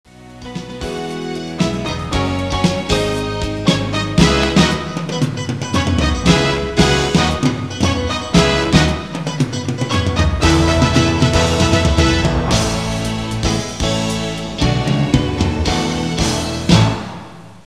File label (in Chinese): 片头音乐